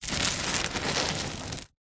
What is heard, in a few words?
container tub plastic heavy short slide gritty concrete